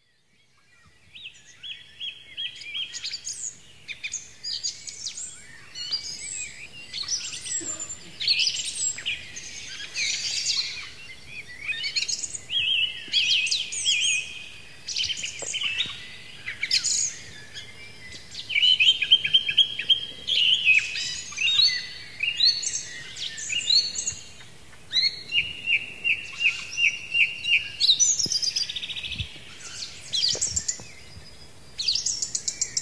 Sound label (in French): Musique Relaxante Forêt chants oiseaux; Relaxation réduire stress; RelaxAction®